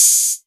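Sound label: Hat Open, Novation Drumstation; DrumstationV1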